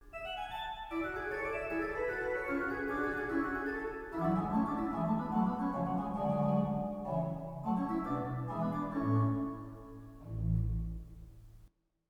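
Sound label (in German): Orgel, pfeifenorgeln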